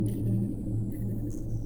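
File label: Music Dpad Evaporate 145; from dust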